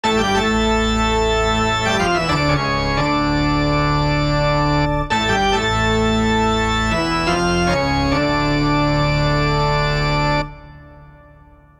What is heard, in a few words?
Mad Organ; Halloween Skull and Bones